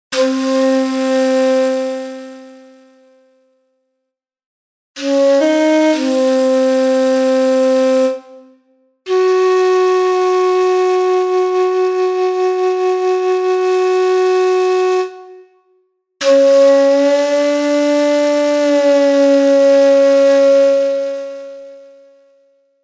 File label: India Bansuri